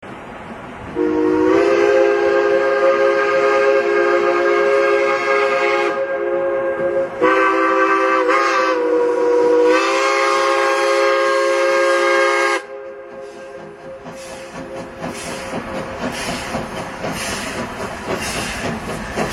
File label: loop sound